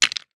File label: Stones